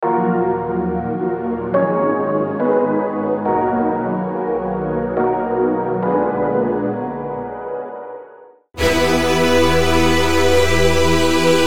Chords UK Drill UK Grime

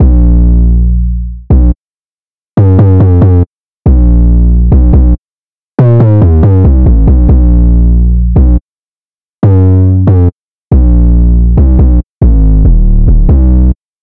Bbm 140bpm; Herse

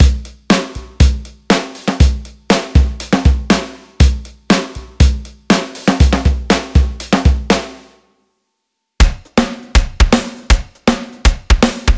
Rock Metal

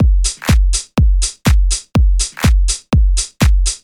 123 BPM

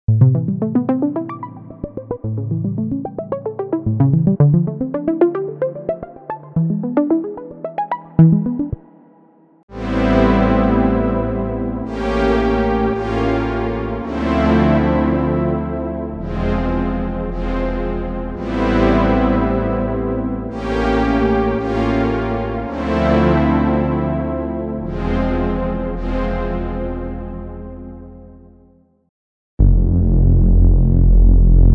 R&B; unisynth

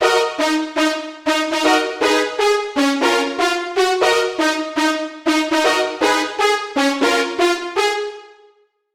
Ebm 120bpm

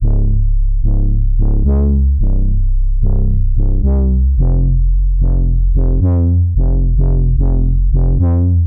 Ambient Downtempo

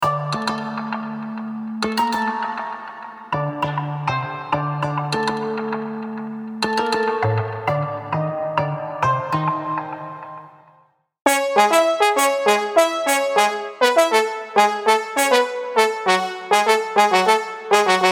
Melodies Dancehall Afrobeats

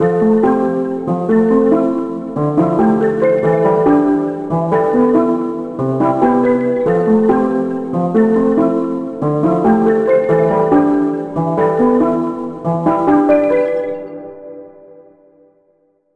C 140 bpm; Idolatry

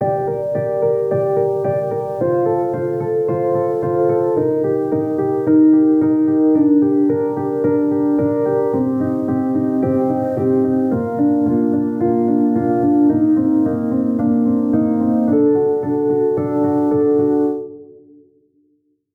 Chords Rhythm Classical; midi wizard

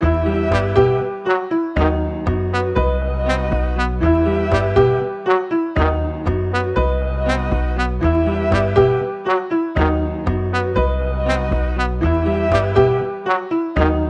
120 BPM Em; Crypt

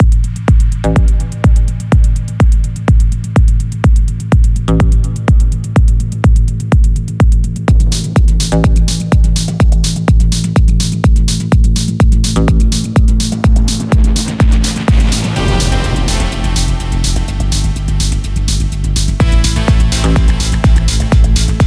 Techno; Unison

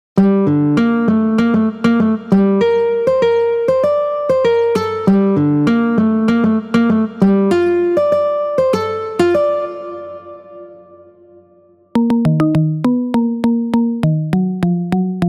Melodies Latin

